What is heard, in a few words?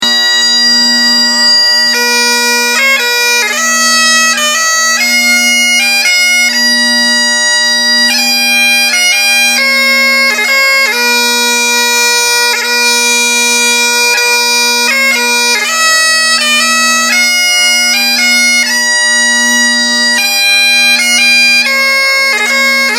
Great Highland Pipes; bagpipes; The Skye Boat Song; Jared Malone; bagpiper